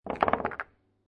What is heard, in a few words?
Billiard Pool Snooker Put Several Balls On Table; Sports; Lynne Music